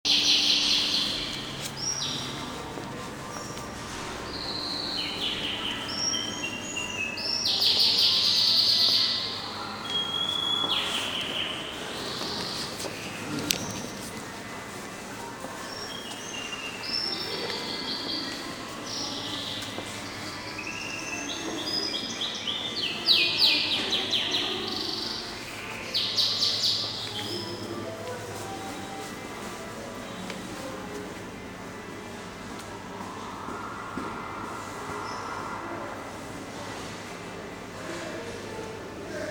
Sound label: eminonu dorduncu business centre ambiance